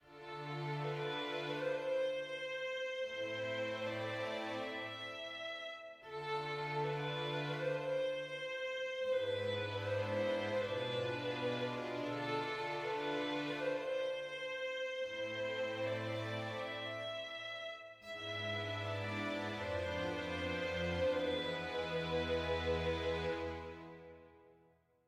sad med; Quivr; PS4 Windows gamerip 2016

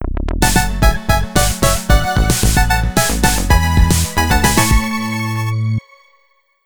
Jingle; fat mask

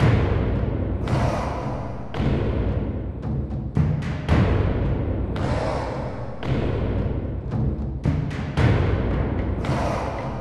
Music Fight Vikings Theme Easy 56bpm Loop; asterix obelix slap them all 2

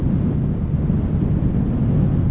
Ventil Distance

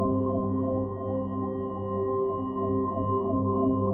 EnergY Ball; Puddle Plus

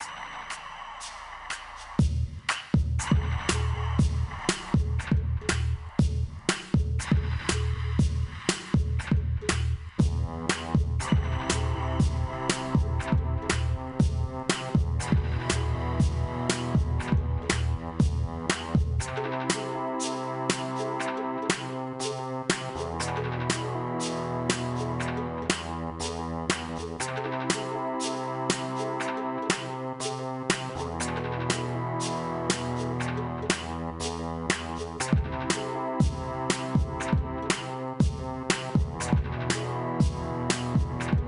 Electronic

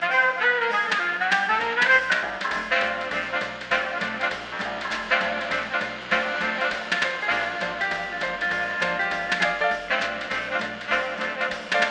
Radio Song; blair witch volume i rustin parr

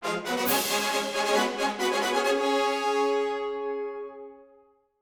Music Cutscene Ingame; asterix obelix slap them all 2

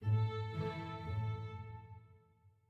happy soft end

